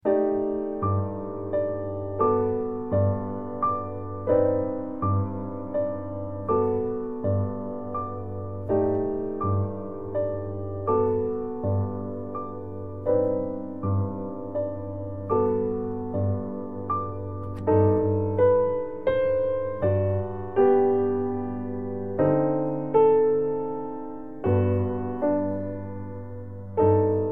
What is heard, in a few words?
easy piano